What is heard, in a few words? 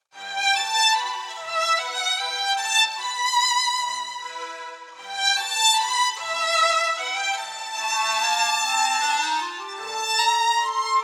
spring waltz, ringtones; Samsung; Galaxy Tab SHW-M180S